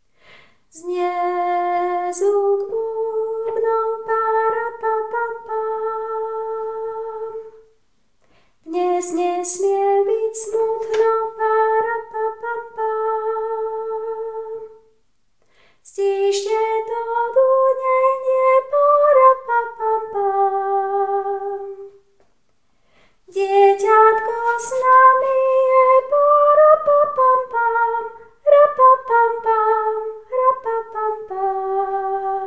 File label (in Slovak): sopran; Farnosť Letanovce